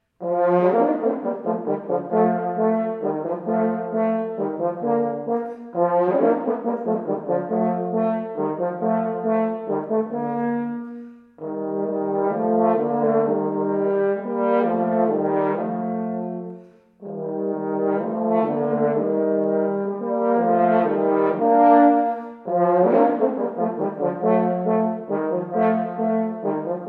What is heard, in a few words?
Hornduo